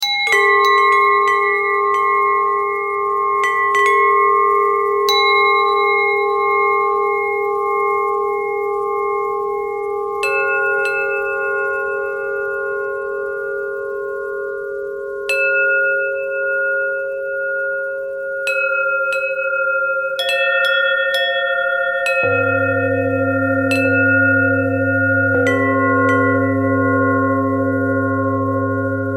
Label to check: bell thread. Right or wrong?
Right